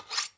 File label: Blade